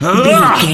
voice male attack groan

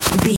footstep snow run

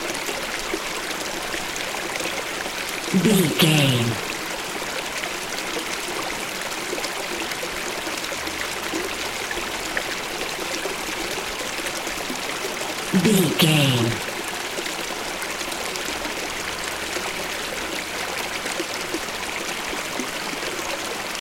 river stream flowing water loop long version

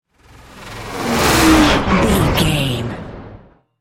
sci fi vehicle whoosh large

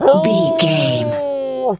wolf hurt

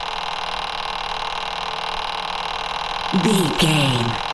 engine ticking hum generator small loop

